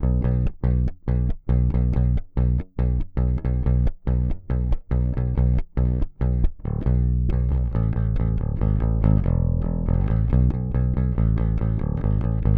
bass orig

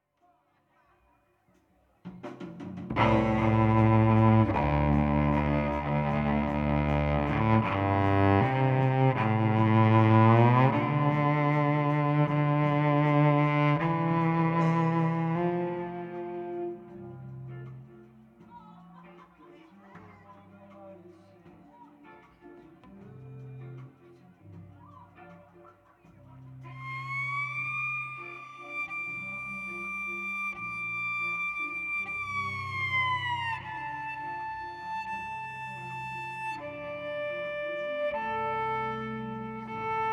cello processed

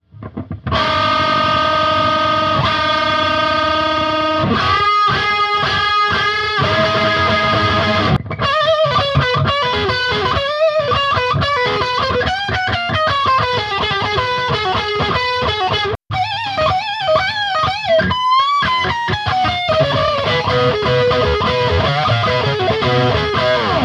original lead guitar

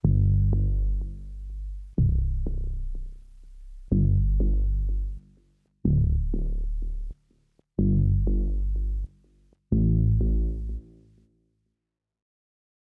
basses processed

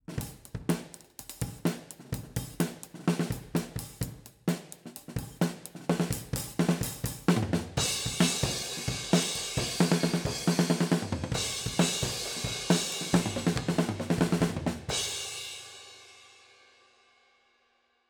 kick distance blankets

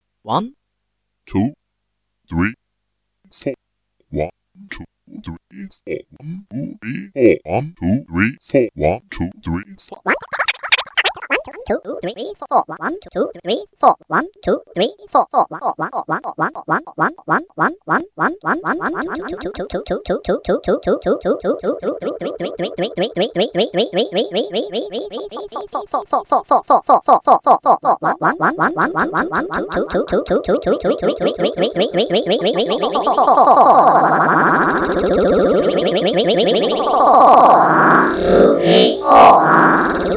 granular voices

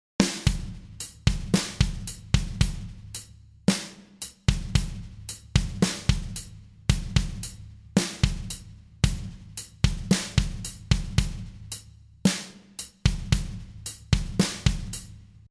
ezdrummer processed

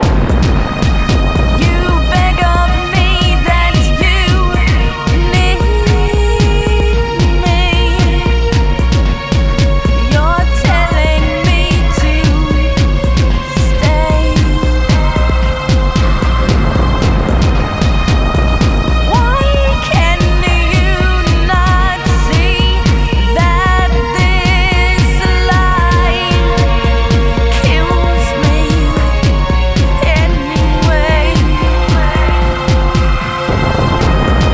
dynamic eq bypassed

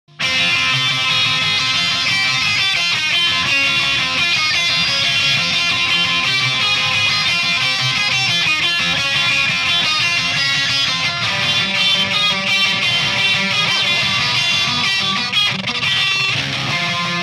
lead gtrs original

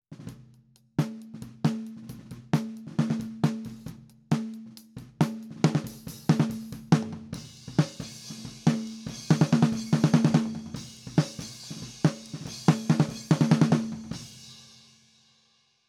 snare 3 mics top sm57

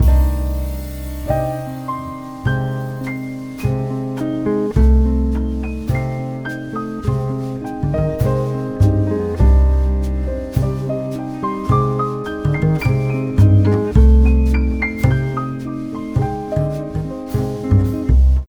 jazz keys original mix

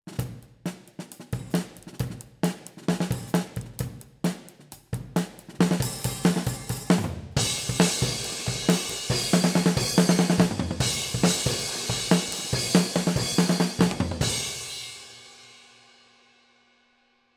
snare mics oh

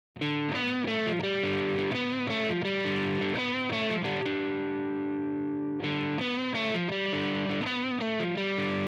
original guitar

